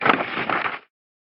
skelly pain

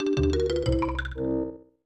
Lose Minigame; Alice's Paint Adventure; SNES

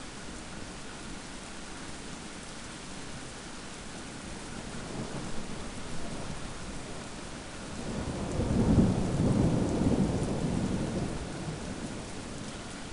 weather thunder loop; adams venture origins

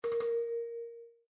Feed Discovered; Cityscape

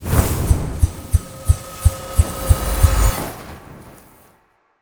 warrior enemy light explosion pre

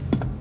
Ladder

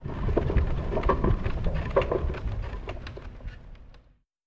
log rolling; adams venture origins; Kismet

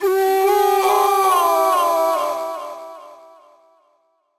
Warrior Death

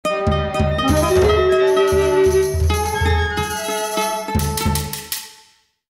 Front Screen Tuning; Shrek the Third; PS2

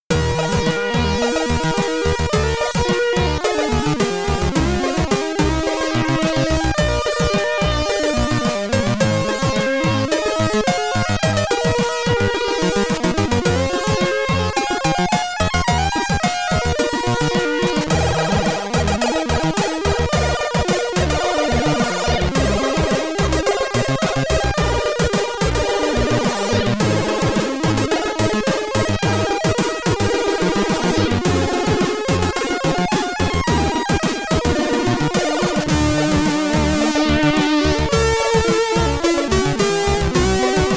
BGM C, SID Stereo; brain artifice; commodore 64

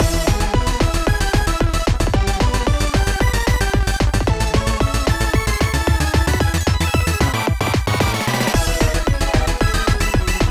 Battle Theme Techno Machines Hurry Up!; bomberman 2; nintendo ds

